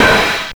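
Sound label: hi-hat open; USB Soundscan vol.11; Drums Dance & Groove; AKAI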